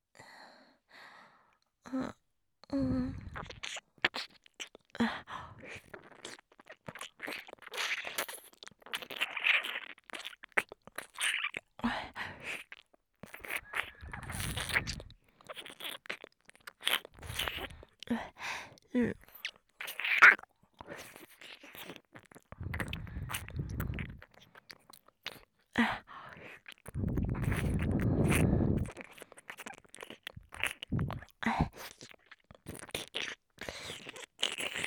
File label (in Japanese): 耳舐め, ASMR; SEあり